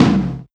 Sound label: Big tom, Drums Fairlight IIx